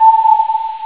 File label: ping